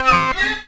knopka cifrovoi dolgii skripuchii blizkii

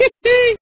hihikane

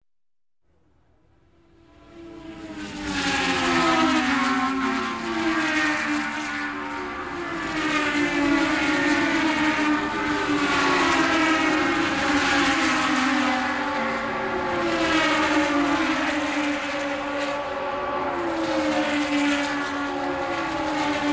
gonki formula-1 proezd bolidov na 3-m kruge

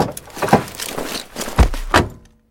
nevnyatnij suetlivij shum

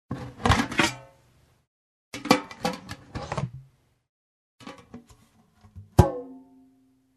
berut tazik zhestyanuyu posudu

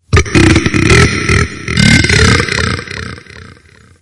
grubaya otrizhka v peshere

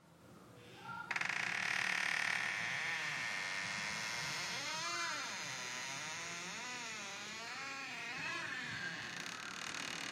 skripuchaya dver

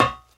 korotkij gluhoj metallicheskij stuk

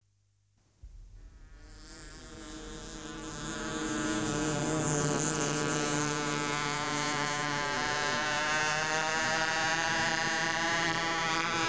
samolet na radioupravlenii

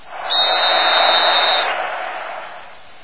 shum tolpi i svist

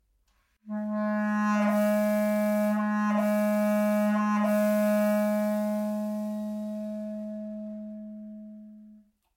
klarnet melodiya monotonnij

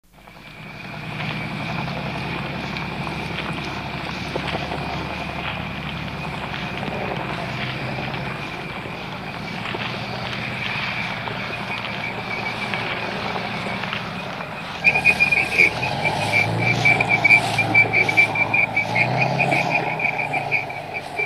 tank amerikanskij vertolyot na zadnem plane

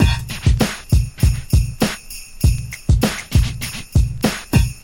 barabannij lup klassicheskij hip hop

